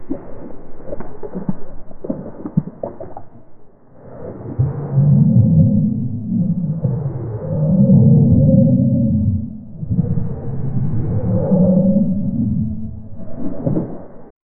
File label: underwater ambience; exploration